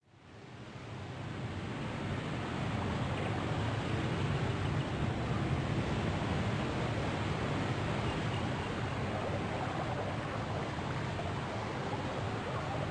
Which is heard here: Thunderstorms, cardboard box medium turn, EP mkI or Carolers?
Thunderstorms